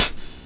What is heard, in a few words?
HH OPEN